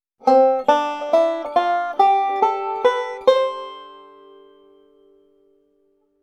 c dur tonleiter melodic style

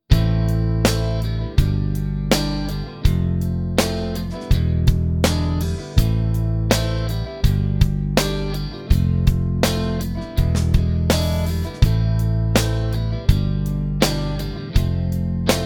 typ akkordverb moll i moll v iv dur v7 moll

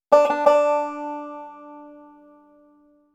single string triole

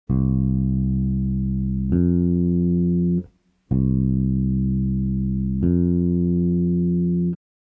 reine Quarte

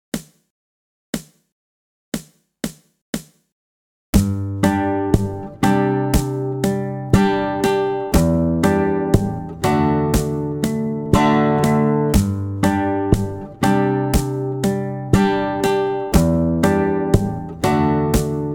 backbeat percussion bassdrum sound langsam

